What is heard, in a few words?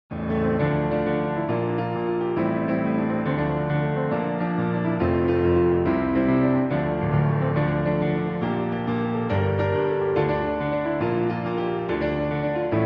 caribbean ringtone; pirates of the caribbean